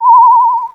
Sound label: Uniscanner, Retro Games Sound FX